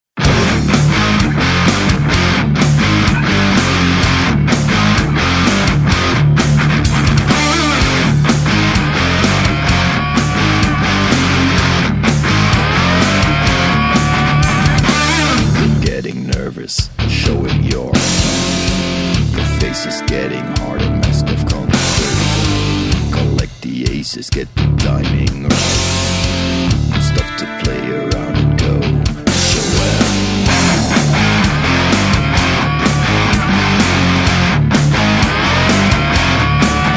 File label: Vocals